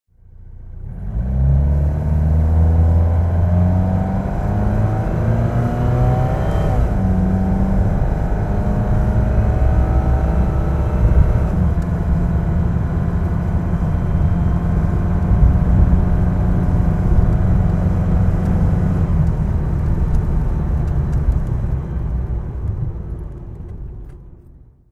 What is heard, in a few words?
ford prefect driving